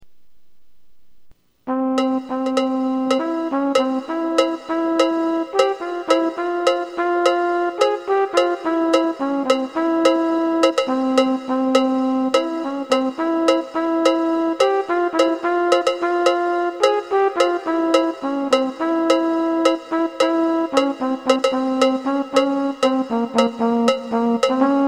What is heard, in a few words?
cowbell